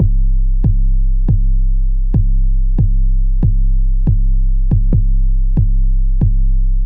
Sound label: 808 Bass C 70 bpm; Pinnacle